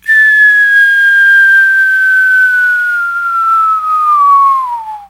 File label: Whistle; kontakt